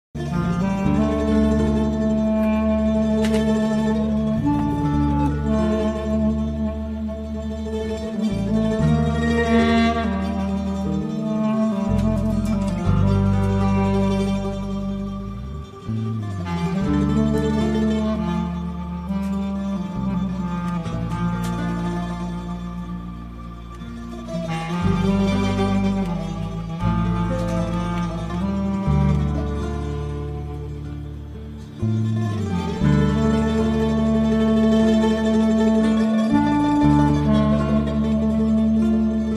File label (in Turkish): Enstrümantal fon müzik Akustik versiyonu; Meryem